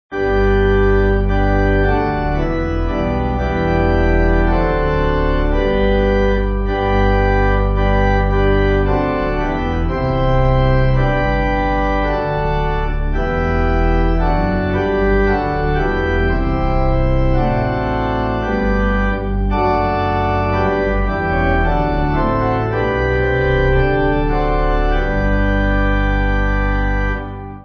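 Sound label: Pipe, Pipe Organ backing; All people that on earth do dwell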